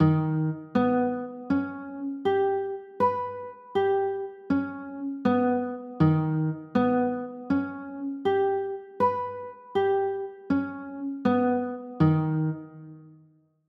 d sharp 7 sharp 5, chords

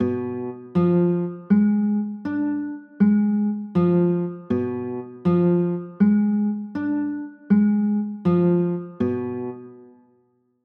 g 7 b9, chords

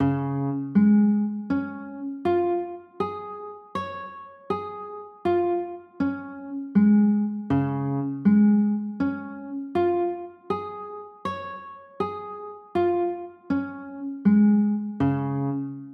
d flat maj, chords

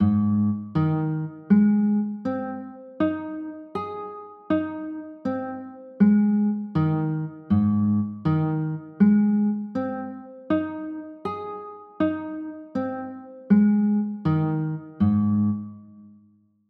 g sharp maj; chords

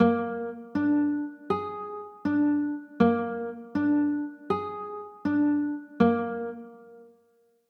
b flat 7, chords; pos 15

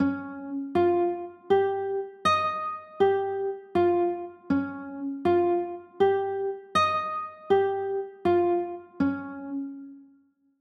e flat 9, chords

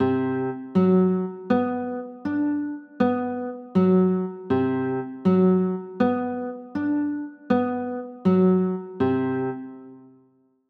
d 13; chords

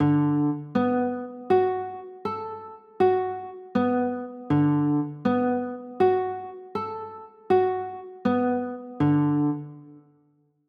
b m7, chords; pos 7

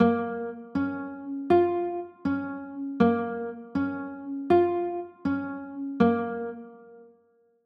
a sharp m, chords

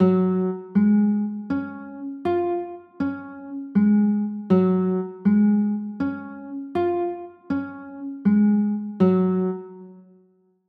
g flat maj9; chords

